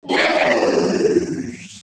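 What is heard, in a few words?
afflicted rodian female attack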